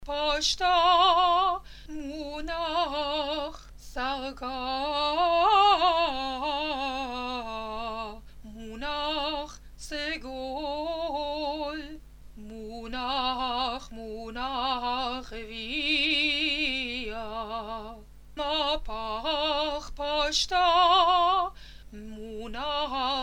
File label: Haftarah Zarqa Table Western; Haftarah ZARQA-TABLE (Western European; Torah